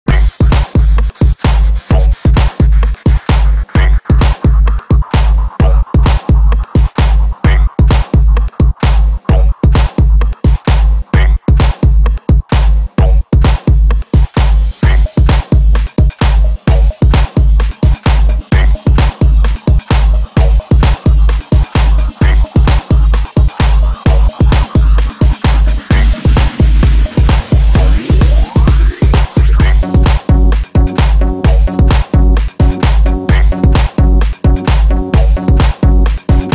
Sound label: Breakbeat Remix